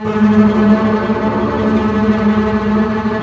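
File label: double bass tremolo; String Master Series; Cbs FX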